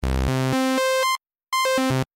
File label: noise wave sawtooth; retro sound effects; synthesizer; Si Robertson